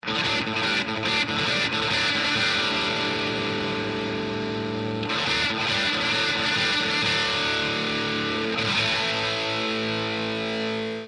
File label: ringtones